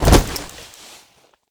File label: bodydrop concrete; Escape from Tarkov